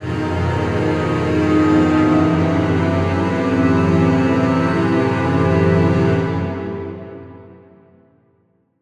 Suspense Strings Low, horror strings

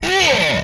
hit, vocal; MMRetroArcadeSoundsPack

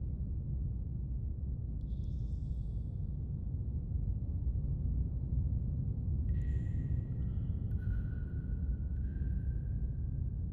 Ambiance Cave Drips Loop Stereo; Nature; Essentials Series NOX SOUND

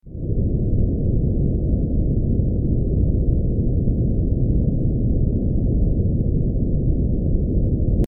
Ambient Wind; horror; horror sfx vol 1

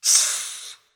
weird, sci-fi; sci-fi sfx

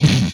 Impact, retro arcade; MMRetroArcadeSoundsPack

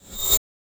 Rev Sweep; FREE SFX PACK VOL 1

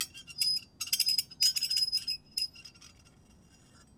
Unpleasant Scraping, kitchen knives; Micro Pack

